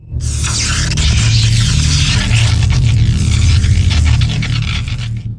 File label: electric; protoss